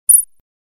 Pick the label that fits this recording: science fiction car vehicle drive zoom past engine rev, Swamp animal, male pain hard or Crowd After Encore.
Swamp animal